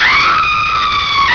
SCREAM

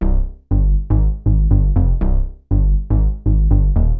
BASS, DANCE; MAGIX

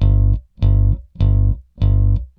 BASS, ROCK POP; MAGIX

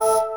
voc glock; symphoniepro; 16bitsamples

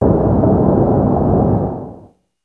storm; KRONDOR; COMBAT

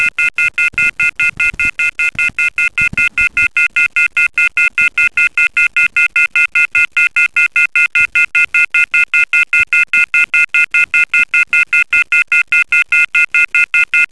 PHONE

